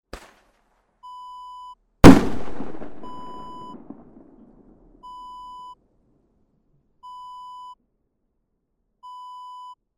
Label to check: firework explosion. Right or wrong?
Right